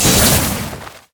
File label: tantrum; LoL; badrobotamumu